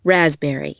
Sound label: raspber